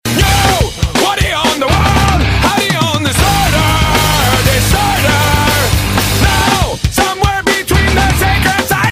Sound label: tecnohard